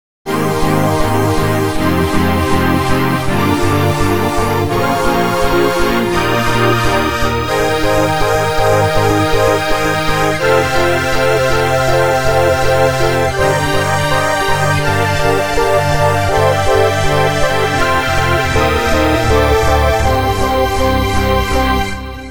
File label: hydrogen seq; the space soundset